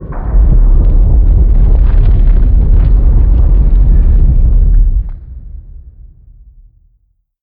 Glow Sphere Close

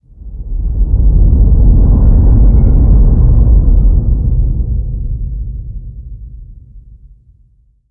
Random Subterranean; moul

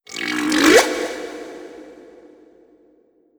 Critter Female Verb; Kahlo

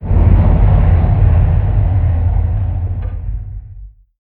Middle Stop

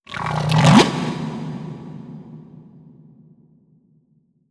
Critter Male Verb; Kahlo

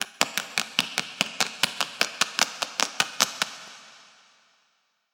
Claws

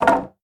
Plank Stn Hit

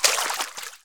Water Run

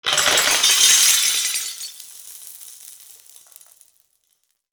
Crystal Dissolve

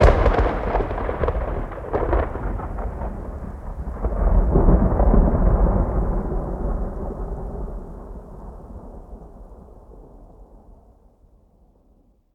Distant Strike

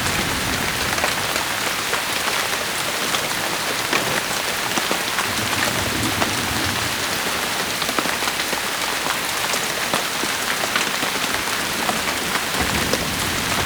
Rain Loop

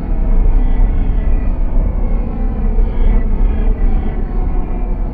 Protractor Loop

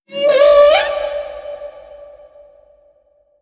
Critter Male Verb; Kahlo

